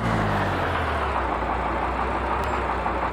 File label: slowdown, vehicles cars; lwcars; gmc savana